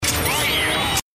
Fire Switch

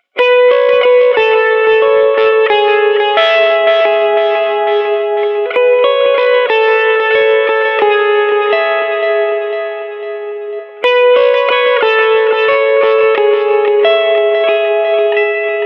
Nice Guitar Tone

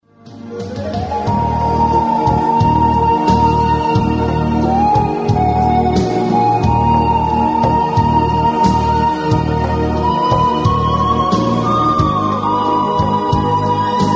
Slow Music

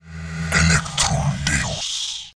Electro

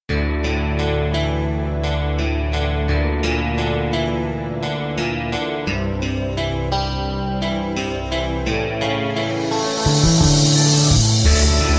Ring Tone

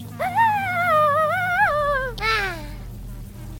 Scream; Woody

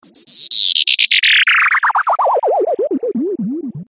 Sweep

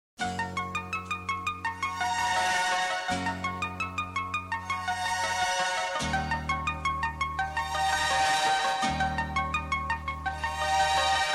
Nokia Lumia

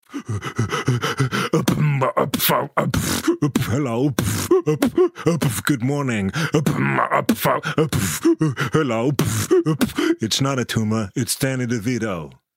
Beatbox

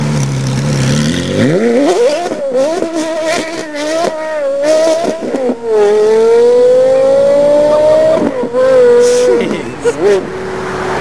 Carrera Gt

